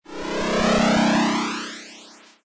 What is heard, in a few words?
Warp; fascinatedsound